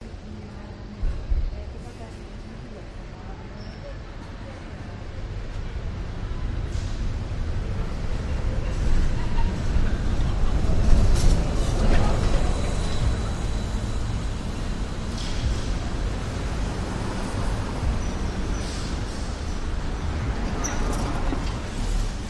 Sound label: athens side streets weekday evening; veikou; your free sounds